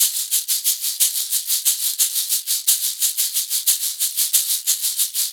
ETHNO EXTRA 90; ethno2

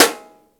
SD HIGH, snare drum; SNAREDRUMS

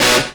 HIT

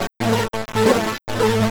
TECHNO SYNTH 140; Keys

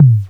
TOM; KillerTweaksII; DirectWave

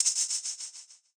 Hat

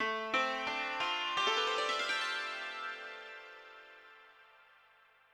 ethno, 90, D

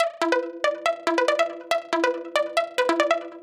ELECTRO SYNTH 140 A

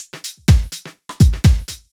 DEEPHOUSE BEATS 125

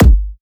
kick, drum hits; Anthemic

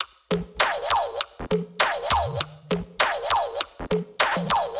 100 Disco Top, Percussion; Loops; Ketamine Disco Top; Touch Loops; CosmicFunk

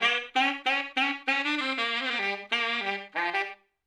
OLDSCHOOL BRASS 96

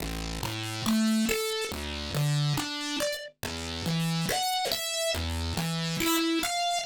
synth tekno 140 A; Techno2; Keys

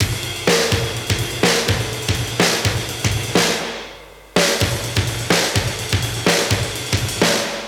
125 Classic Breaks, 125

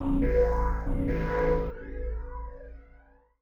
TECHNO 140; Techno2

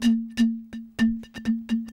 bottle, ethno, 125

